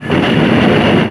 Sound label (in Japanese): 40mm taikuu